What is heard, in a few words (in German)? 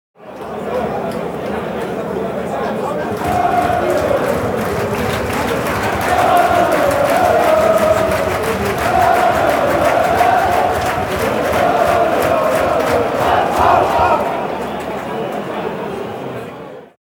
Stadion Fans und Gesaenge